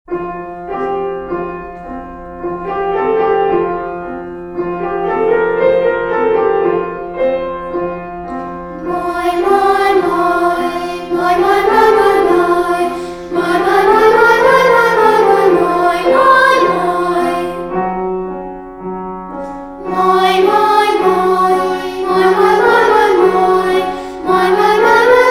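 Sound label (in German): Übung; musikschule bayreuth; kinderoper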